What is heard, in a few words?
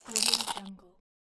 potato crisp crunch; stormwaveaudio